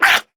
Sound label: Attack Effort; Grineer Jester; Warframe; Battle Barks